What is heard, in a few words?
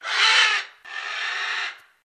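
porcupine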